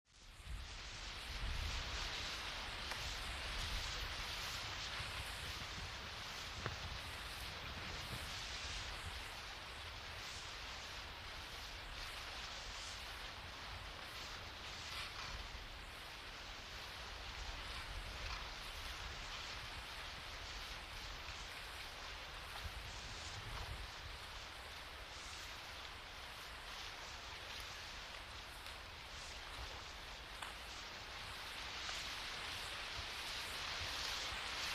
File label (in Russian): Shum vetra v kamyshe